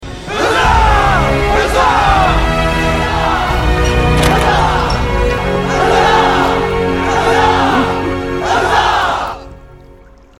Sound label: hurra